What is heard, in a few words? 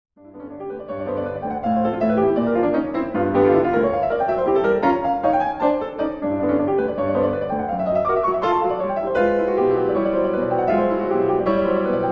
Preludes for piano Allegro